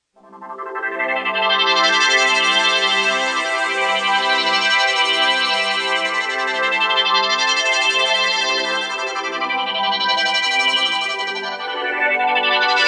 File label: K5 Filter; k5synth